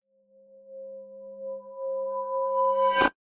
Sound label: metal chime hit reverse build crescendo; sound design